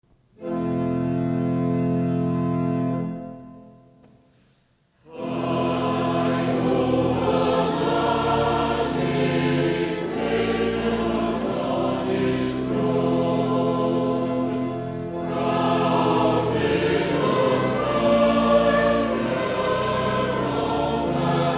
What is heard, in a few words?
high over lashing waves